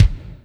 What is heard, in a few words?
kik Foot GT, Alesis D4; Drum Machines